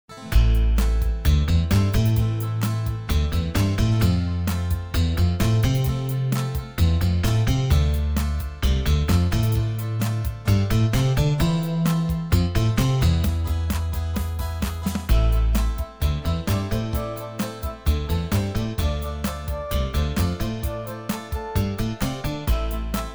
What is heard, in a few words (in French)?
Accompagnement